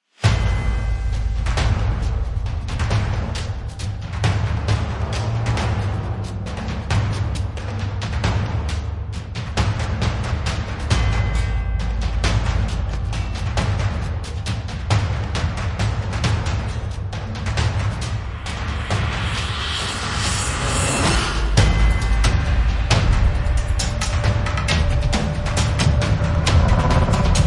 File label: Gothic Action Percussion; Gothic Storm Music